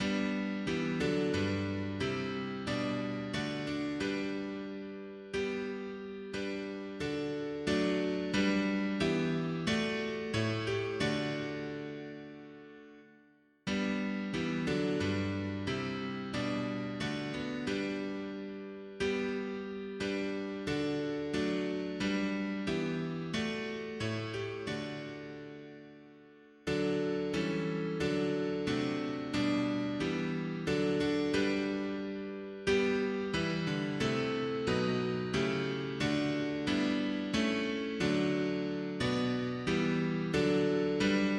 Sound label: mono piano; hymns; Himnario grande